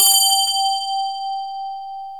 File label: BELL G4